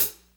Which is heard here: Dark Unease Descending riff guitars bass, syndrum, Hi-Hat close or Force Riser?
Hi-Hat close